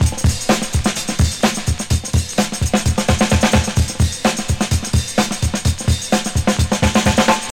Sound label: 128 bpm high quality drum loop sample g sharp key, Free drum loop sample - kick tuned to the G# note